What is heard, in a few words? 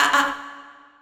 AAHAA VOX, Funky Vocals; USB Soundscan vol.18